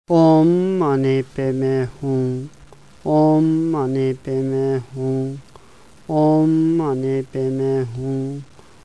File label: Om Mani Padme Hung